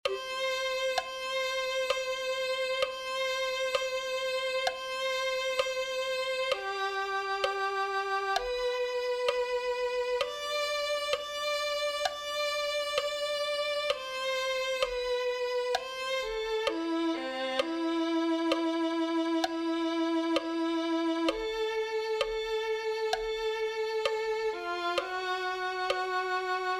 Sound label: violin